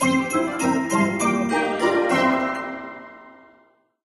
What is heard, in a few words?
xmas load